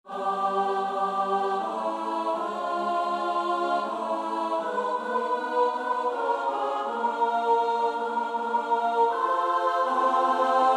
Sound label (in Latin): Salve Regina (S-A-T-B); Witt (Robert William); Four Motets to the Blessed Virgin Mary, opus 29